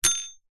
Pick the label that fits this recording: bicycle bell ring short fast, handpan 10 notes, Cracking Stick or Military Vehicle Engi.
bicycle bell ring short fast